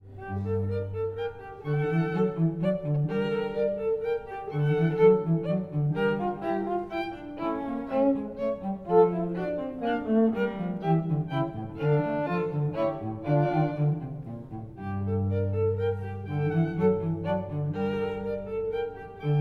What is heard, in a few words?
Mozart String Quartet 12 menuetto K156